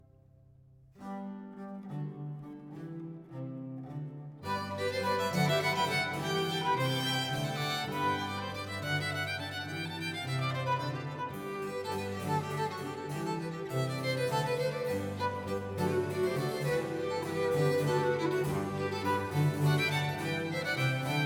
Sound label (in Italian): ciaccon; early music; monteverdi madrigals; ballo volgendo il ciel movete al mio bel suon